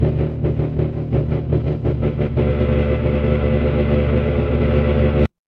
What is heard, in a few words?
mechanical engine